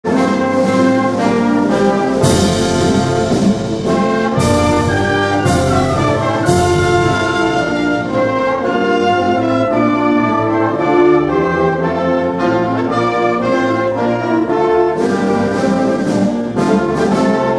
March

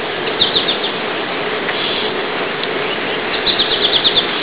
goldfinch; birdsongs

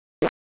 fart

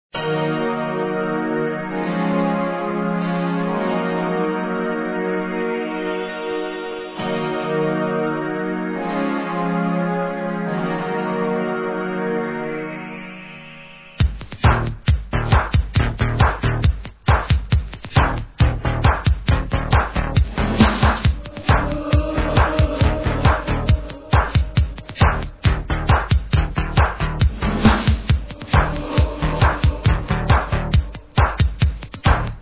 techno

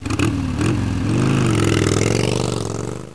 varoom; Scoot

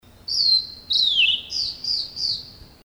Steeres Liocichla Duet

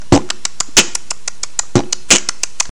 ritmo

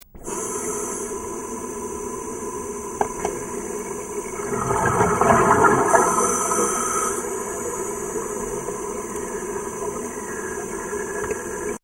Deep No Noise

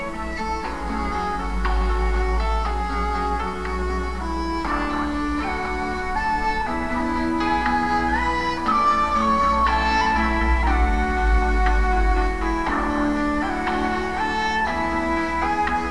music

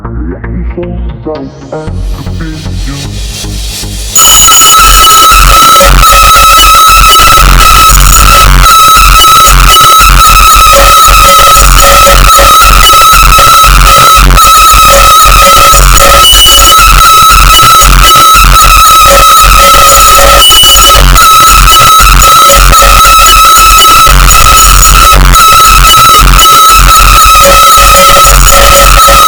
ufo

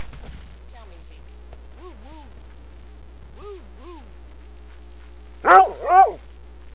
zeke woo woo

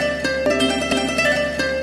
guitar

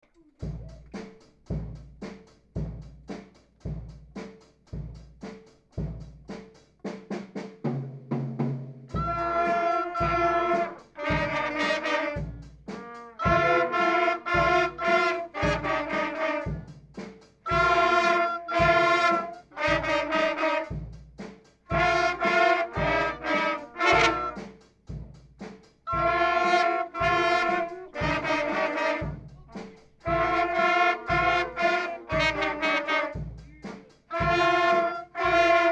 Rock Tune

